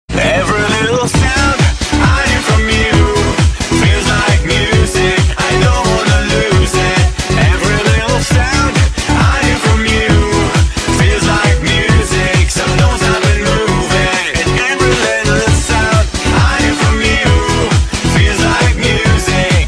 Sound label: Knob Music